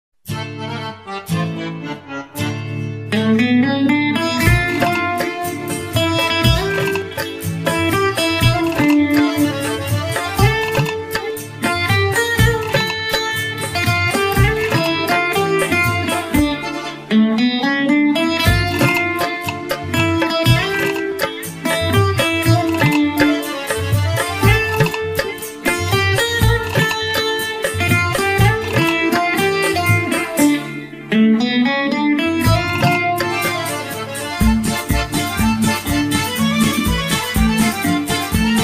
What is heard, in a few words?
guitar